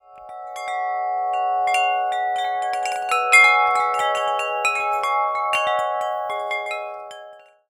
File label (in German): Röhrenglocken (Windspiel)